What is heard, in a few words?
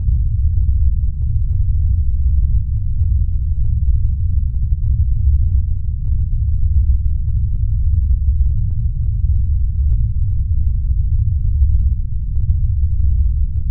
Landing (SFX); Jet Force Gemini; Landing on Sekhmet